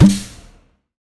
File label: shoot gas